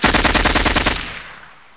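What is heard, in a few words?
machine gun burst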